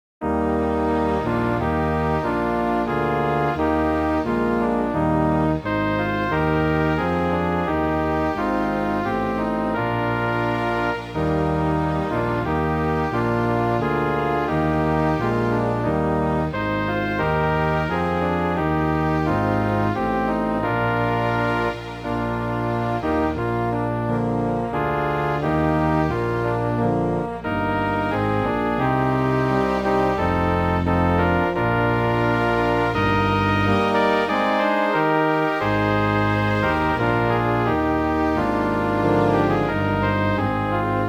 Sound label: Anthem; Ascar